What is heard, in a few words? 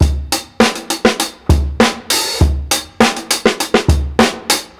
60'S STYLE 100 BEAT, DRUMS LOOPS; BASEMENT DRUMS VOL 2